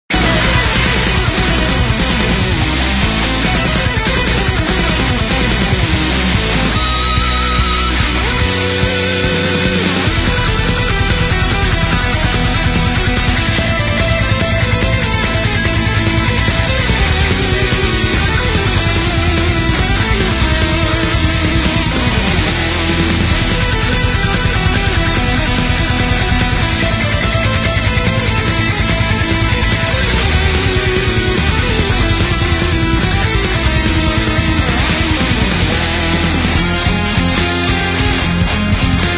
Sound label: Battle loop; Drago Noka; PS4; FatalBlood